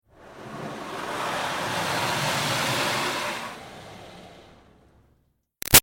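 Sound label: Water Spray Interior Car By Soft Slow; Car Wash; Spraying; Objects; BigRoomSound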